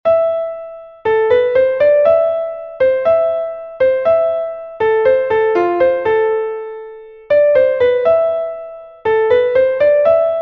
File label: Swan Lake, Classical Piano